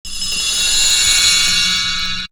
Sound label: Perc; Coldcut's Kleptomania VOL1